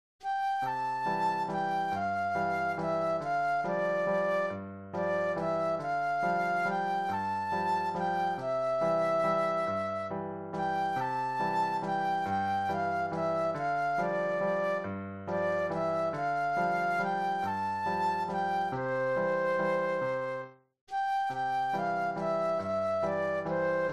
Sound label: Flute Solo; classical